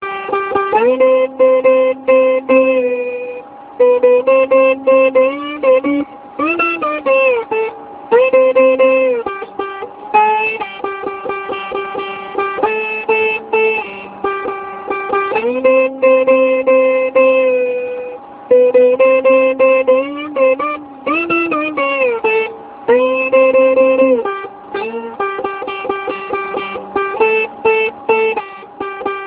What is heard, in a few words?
Ukulele